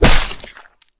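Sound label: foul knife strike on opponent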